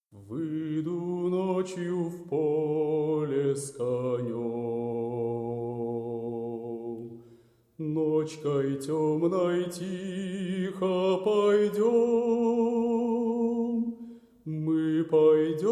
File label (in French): chant russe extrait